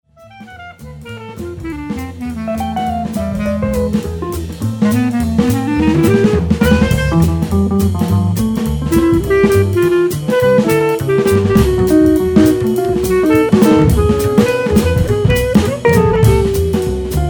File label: Jazz